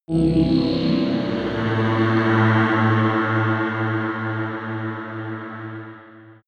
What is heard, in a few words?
massive access granted